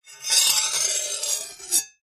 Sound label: warfare knife blade slow scrape metal